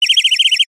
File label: cartoon bird chirp